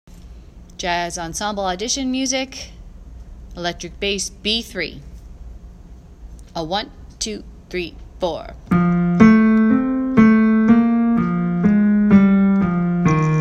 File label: bass